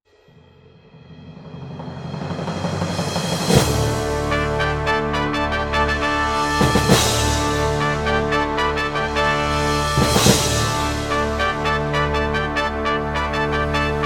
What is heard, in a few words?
fanfare